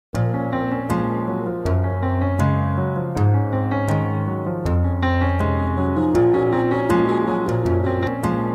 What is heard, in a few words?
High Volume Ringtone For Mobile